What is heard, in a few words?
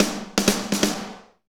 Medium Tekno, Drumscapes Roland